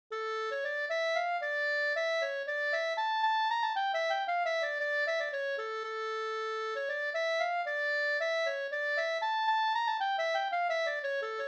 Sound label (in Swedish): polska polska; Farfars polska efter Olof Johansson, Nästeln, Jämtland, upptecknad av Arthur Nestler